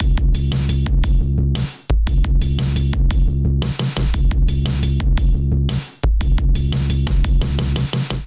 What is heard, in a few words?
Melodic 116bpm; Drums; MIDI Clips; Wayman Kit Melodic; Drive and Glow; Ableton; Factory Packs